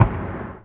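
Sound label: mg42 distant; WAR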